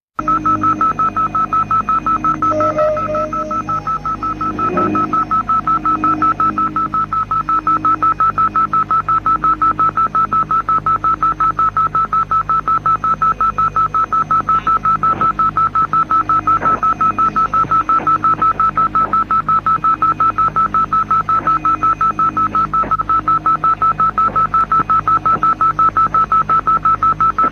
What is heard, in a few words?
rapid dots